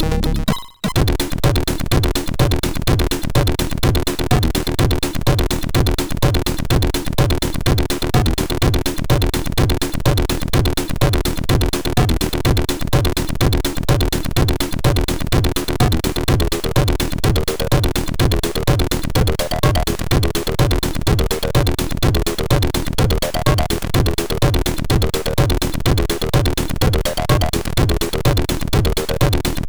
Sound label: MOS6581R4; Early Tunes